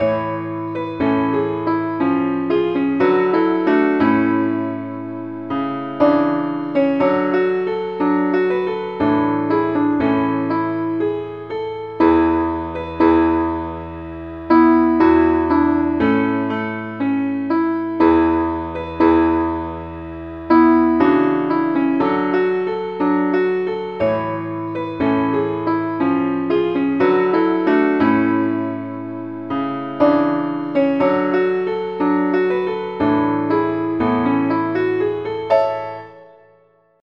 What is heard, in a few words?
keyboard Only key D